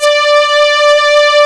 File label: string; Inside Mac Games; X-Mas Lemmings Demo; Music